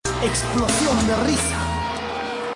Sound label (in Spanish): explosion de risa